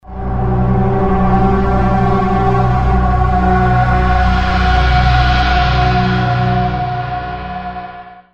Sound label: Game Over